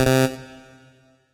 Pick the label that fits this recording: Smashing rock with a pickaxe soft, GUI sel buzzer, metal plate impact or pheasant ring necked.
GUI sel buzzer